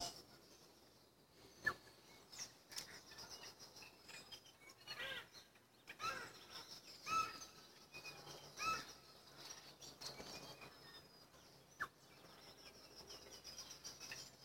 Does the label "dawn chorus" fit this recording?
Yes